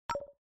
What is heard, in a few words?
OS Sound Notification